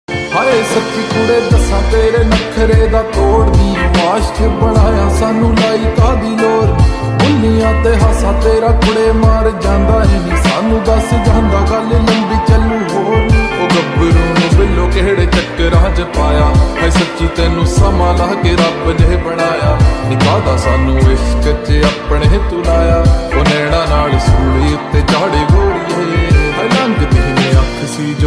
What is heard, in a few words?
ringtone slowed reverb punjabi song ringtone lofi ringtone